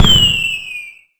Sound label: rocket, fireworks; HeavenStudioPlus